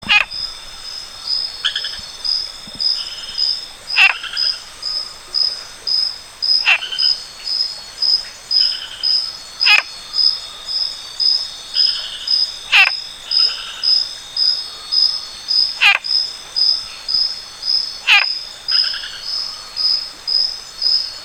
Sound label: Sylvirana nigrovittata